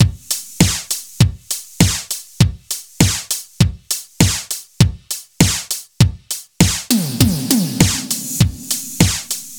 100 Beat Street Beat; Zero-G; Future Wave

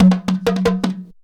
JUNKU RIFFS; Best Service; World Colours

